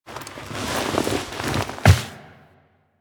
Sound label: Stand Up Foley; Call Of The Tempestarii; Wraith Quest; Reaper On Bridge